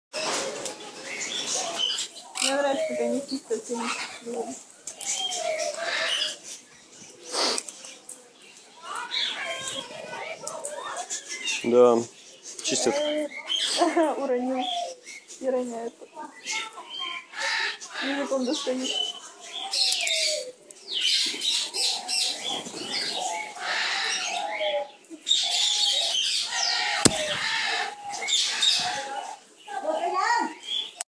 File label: solnechnaya aratinga popugaj sun conures parrot; aratinga solstitialis